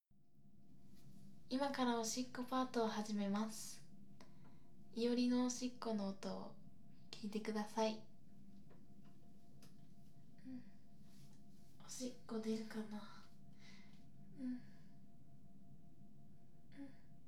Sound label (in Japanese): おしっこ生録音